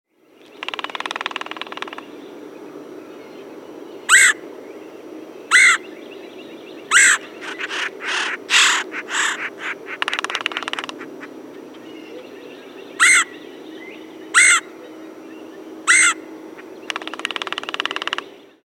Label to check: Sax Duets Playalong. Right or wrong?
Wrong